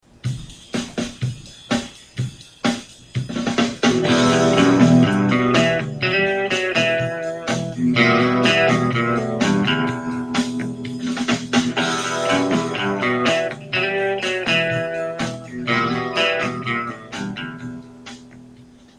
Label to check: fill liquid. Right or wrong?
Wrong